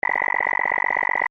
Computer Data Streaming Effect; Computer data loop; Computers; Stuart Duffield

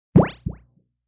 Zap; Zaps; Electronic

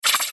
Mouseover Warble Flange Short; Multimedia; Blastwave

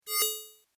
Tech rollover, Rollover: Short, High Tech Effect; Mouseovers (Sound Effects:Multimedia)

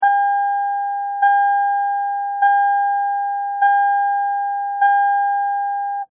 Car Warning Light Beeps-Interior Perspective; Cars; Vehicles

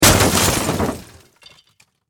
Impact Crash Heavy; Crashes

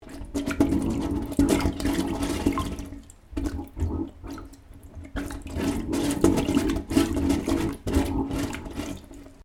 Water Gurgling In Pipe; Weather

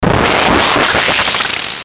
Ascend Accelerate Distortion Fast; Blastwave

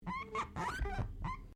Glass Squeak Rubber

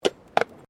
Tram Horse Drawn Horse Step Pavement

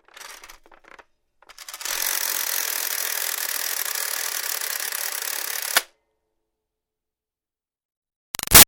Toy Toaster Countdown Timer Tick; Plastic Toaster; Wind Up Toys

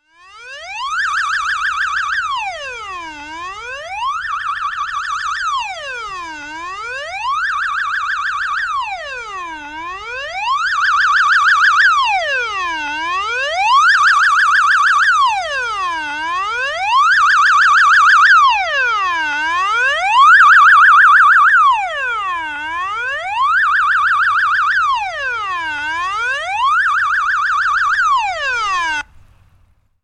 Electronic Alarm, wail with wobble on top; Alarm Sound Effects; BBC

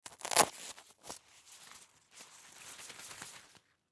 Umbrella Open Velcro Strap Unravel; Umbrellas (Sound Effects:Objects); Blastwave; Foley

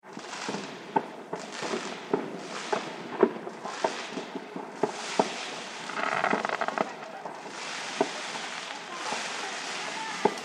Fireworks Celebration Ambience; Firework Sound Effect; Celebration, Party, Holidays

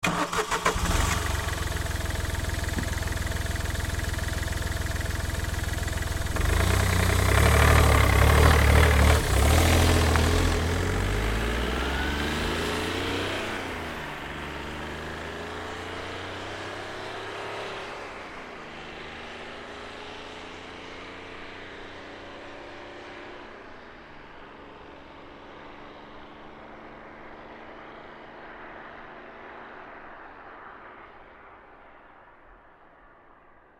Car Smart Car Start Away Slow Chug; 2005 Smartcar Fortwo; Vehicles

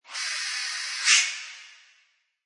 Reverb Fade In Hit; Scifi Production Elements, Set 1; Lynne Music

